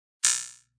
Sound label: Metal Fizzle; Metallic; Electronic